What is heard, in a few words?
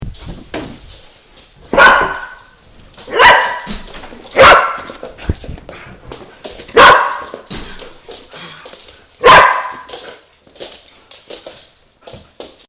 phoebe barks